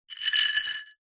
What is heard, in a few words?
household necklace beaded pick up from ceramic bowl or surface